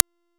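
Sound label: Proto Infested Mutalist Projectile Fire; Warframe; Abilities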